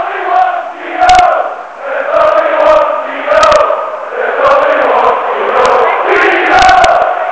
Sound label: only one keano, Chants; Manchester united